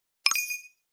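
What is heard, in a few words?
received message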